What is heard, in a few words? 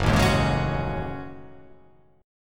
F sharp Minor 6th Add 9th